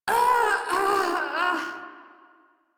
Death; Priest Quest; Palladino

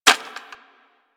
Shotgun Reload Handle Foley; DE; Warframe; Weapons; Skins; Zoboz Shotgun Skin

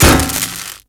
Electro Prod Hit; Warframe; Weapons

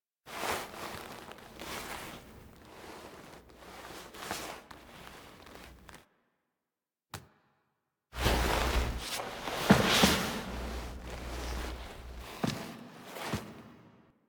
Foley Exit Pod; The War Within; Lair To Orbiter; Operator

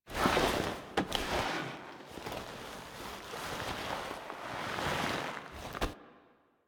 Foley Crawl Forward; Cinematics; Warframe; Orbiter Cradle

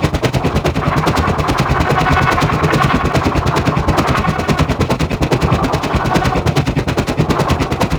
Gas City Gas Fan Large Loop; Ambience; Props; Warframe; Corpus Gas City; Props Remaster

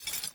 Idle Sword Drop; Arthur Hub; Warframe; NineteenNinetyNine

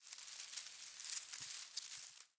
Forest Open Glade Grass Move Close, Day; Ambience

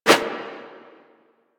Sticky Bomb Idle Catch Whoosh; Grineer; Veilbreaker; Vor

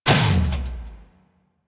Mech Run Stop; Nechro

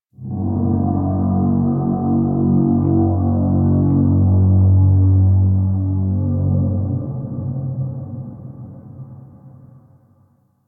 Chimera Main Room Groan One Off; Ambience; Warframe; DE

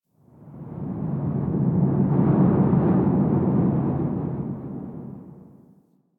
Forest Half Open Cave Wind; Ambience; Warframe; Grineer Forest

